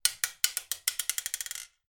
marble single small drop bounce in ceramic sink

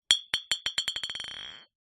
bottles glass empty x2 knock together

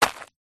footstep single dirt; foley; zapsplat

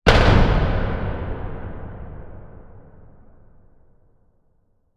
hit heavy metal hard reverb cinematic; sound design; zapsplat

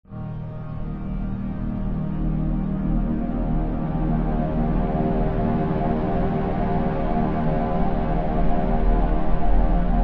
swell drone dark tense synth pad; sound design

